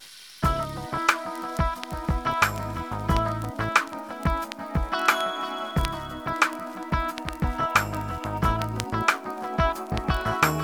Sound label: guitar; clean